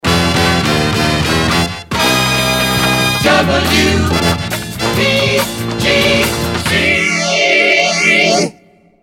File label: jingles resings flanger; pams series 42a